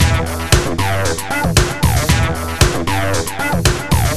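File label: arp acid c with beat; Sonic Foundry (Sony Creative Software); Ugly Remnants VOL-2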